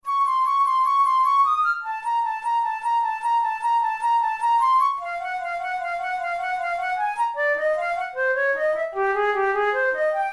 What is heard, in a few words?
melodic exercises, flute tunes